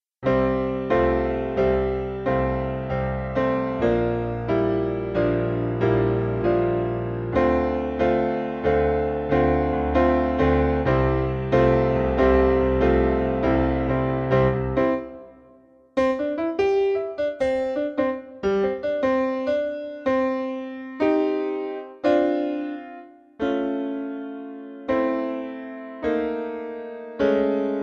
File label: vsti check